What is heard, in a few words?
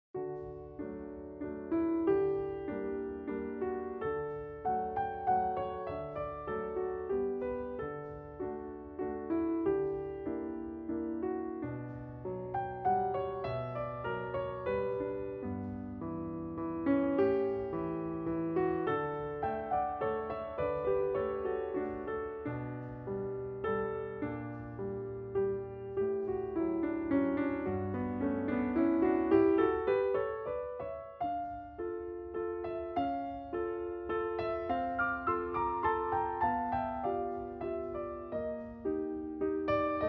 small waltz